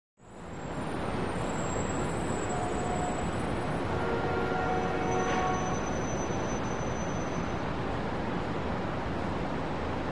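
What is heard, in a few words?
Distant Heavy City Traffic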